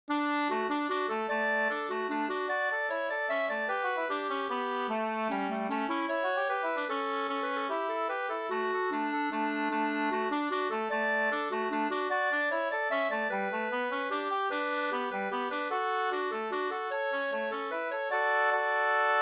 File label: mazurka; Nordstroms mazurka 2st